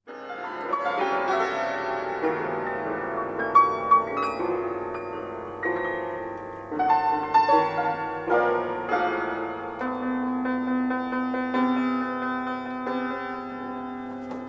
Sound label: drone; drone decorum; contact